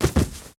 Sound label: Death Body; Antihero